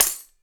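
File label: PERCUSSION TAMBOURINE; Sonic Reality; Interactive Drum Kits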